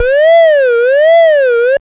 HOUSE ALARM; Masterbits - Tekkno Trax; MACHINES+FX

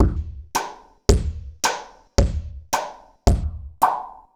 philter beat straight; Sonic Foundry (Sony Creative Software); Ugly Remnants VOL-2

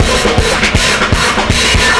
Dodge 120 bpm, breaks; Zero-G Beneath the Planet of the Breaks

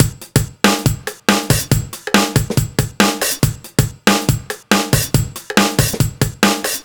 140 Full, Drum Loops; Zero-G; Soundclash Nu Dance Electro; Acidized Wavs; 140bpm

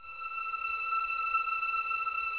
E 6, 11 Violins, Soft; Miroslav Vitous

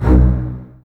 BSS, Basses, String Ensembles; Miroslav Vitous; LINKS ORCH

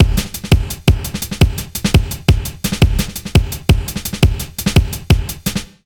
170 BREAK, Explosive Jungle

